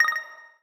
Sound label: notifier trink, notifications; Mozilla; Boot2Gecko